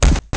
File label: pistol whip, m1911a1; weapons; Soldier Of Fortune II Double Helix; XBOX